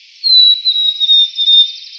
song, chickadee

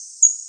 Empidonax difficilis; Passeriformes; Tyrannidae

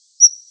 song

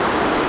song

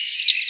song

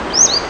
song

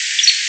song3, Empidonax difficilis; Passeriformes; Tyrannidae

